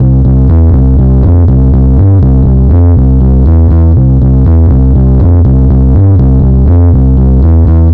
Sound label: fret hop